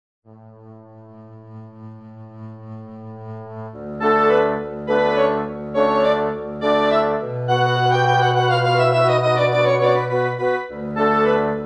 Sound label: midi; Polovtsian 2